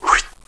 Swish; JONAH

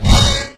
pickup bonus; balloon rain

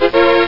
TADA; Dragons Bone Solitaire; Tabuleiro

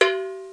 bong

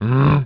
MMMM

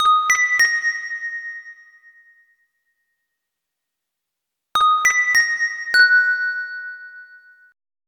BELLS

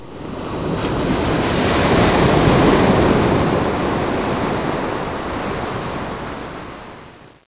ocean; PC Gamer IT CD 31; DINK

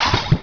Hide Dialog Sound; Widget Workshop; A Mad Scientist's Laboratory (1996); Maxis

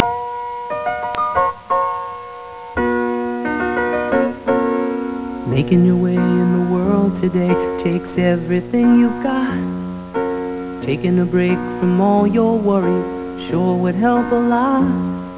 cheers

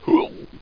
gulp

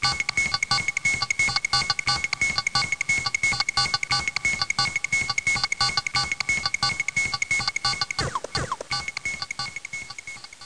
Icon Beeps; Let's Discover Michigan!

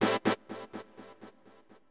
SYNTH HOUSE 125 A; eJay; Keys

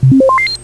Computer Beep; Weekend Warrior; Space Station

